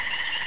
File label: cricket